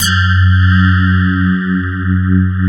CMI PIANO; East West; Twisted Textures-BT

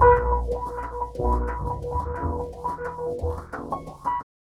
CHD, Jungle; E-Lab; XTC Files of Jungle

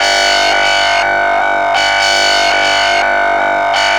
Techno Synth

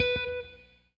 ROCK, Guitar

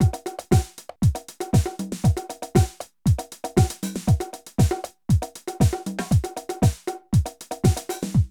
drum loops, House & Garage; Zero-G Groove Construction (1993)

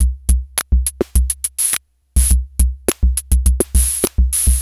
Xtra Fonk 104, Lounge Drumz

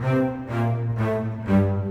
Bass Cello, Orchestral; Sonic Foundry (Sony Creative Software) - Orchestral VOL-2

